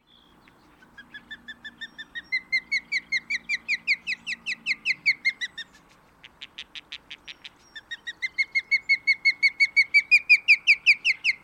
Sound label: osprey